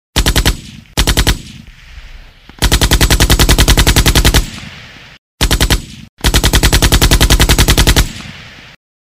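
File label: machine gun firing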